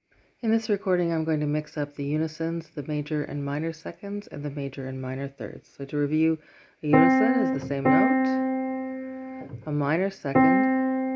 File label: Voice 005: Unisons, Major and Minor Seconds, and Major and Minor Thirds; Interval Identification